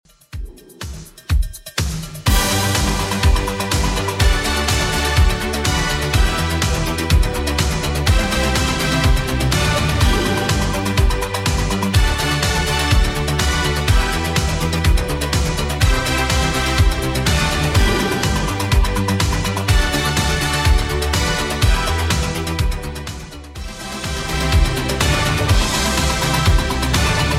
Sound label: 80s Redrum 124 bpm